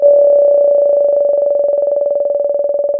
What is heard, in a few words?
pop, Sound of a phone ringing